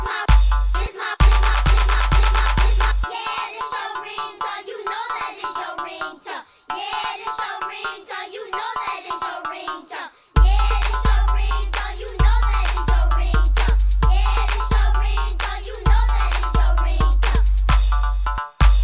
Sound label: Cute Ringtone